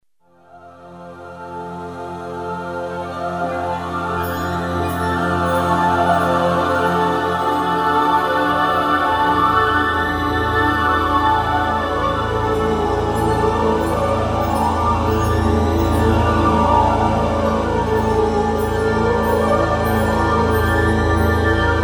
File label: pad flanged, FX rich phasing; yamaha sy99; synth